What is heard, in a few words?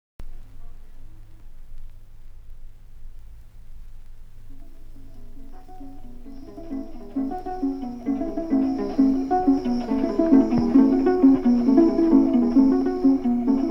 arp